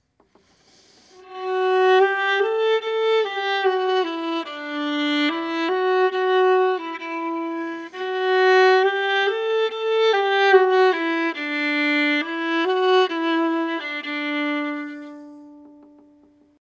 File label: viola da gamba